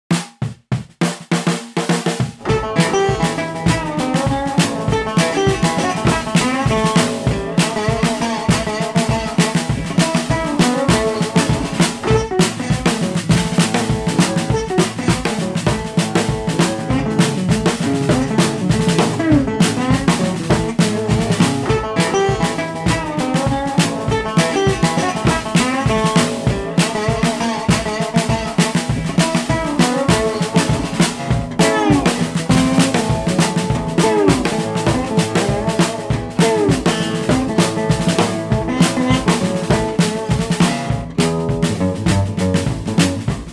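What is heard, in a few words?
Street Resonator Guitar Snare Happy, 100 bpm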